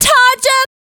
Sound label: Dance Vocals, FEMALE